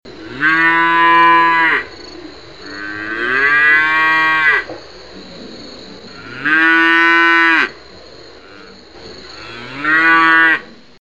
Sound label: Cow baby calf; ORION